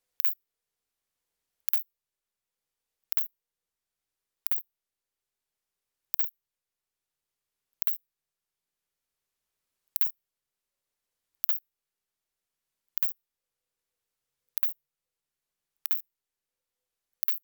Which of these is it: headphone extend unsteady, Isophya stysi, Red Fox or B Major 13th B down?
Isophya stysi